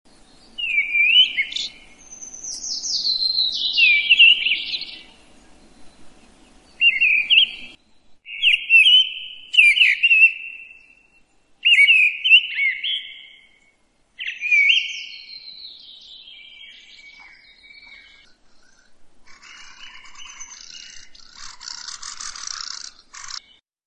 paszkot - śpiew i nawoływanie; wroblowe; turdidae; turdus

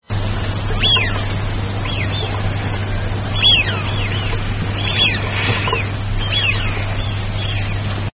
świstuny, głosy

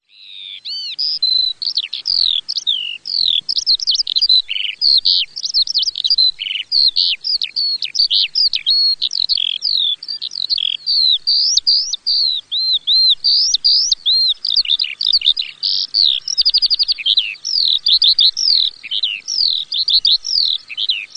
skowronek, długi występ; wroblowe

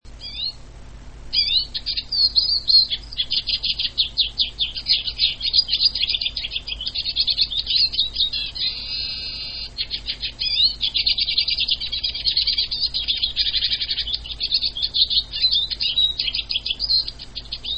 śpiew czyżyka

